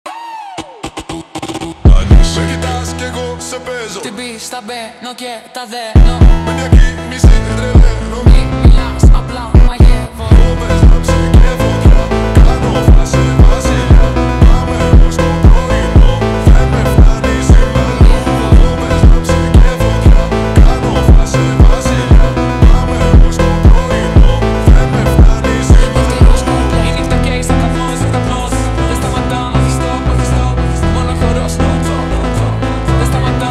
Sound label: slowed reverb